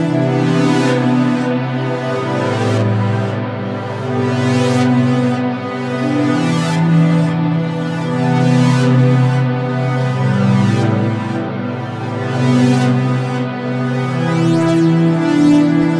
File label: synth pad wet; airspace hybrid reverb and delay; modeaudio